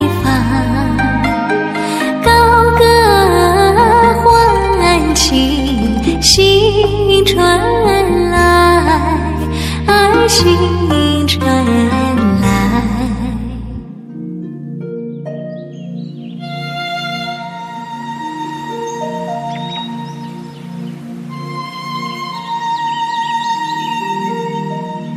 beautiful chinese ringtone

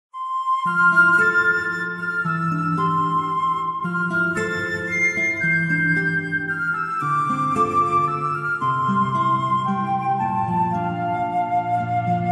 smooth flute; ringtone

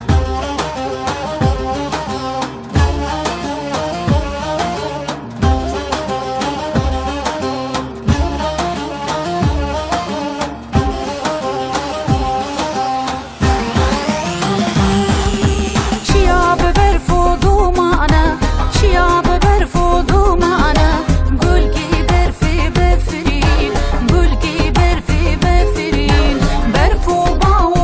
female iraqi song ringtone

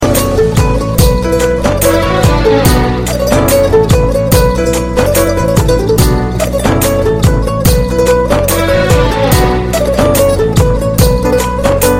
punjabi ringtone

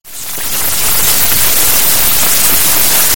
flute ringtone; hero

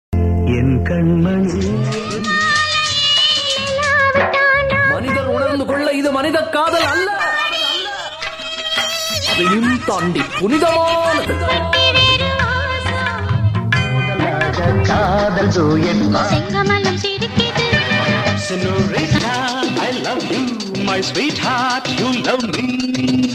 tamil love tone; ringtone

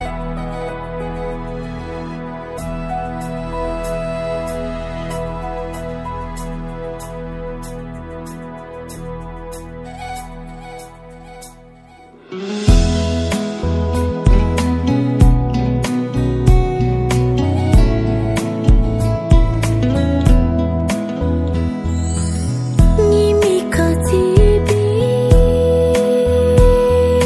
bhutanese ringtone

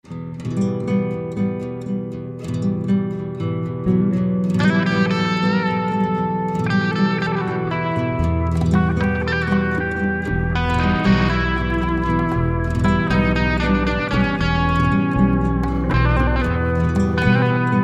latin guitar theme ringtone

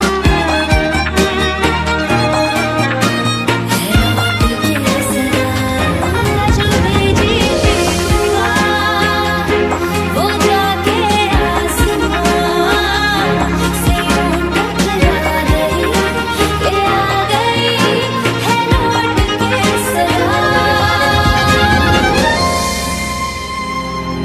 hindi ringtone

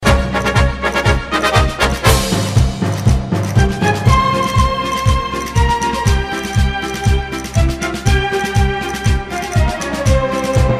latin fire ringtone

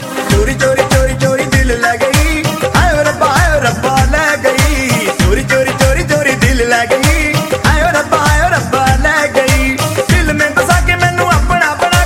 hindi film song, ringtone; garam masala 2005

